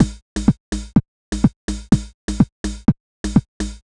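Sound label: perc steady, Classic Chicago House Percussion Loops, 125 bpm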